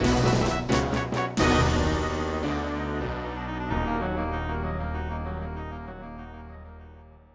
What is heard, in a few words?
Fanfare, Roland SC 55